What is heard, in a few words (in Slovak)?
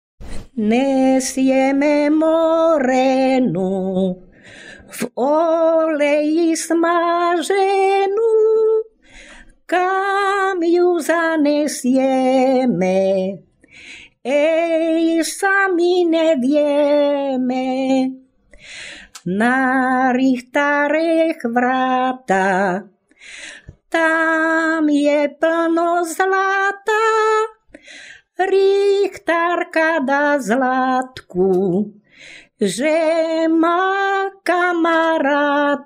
obradovy folklor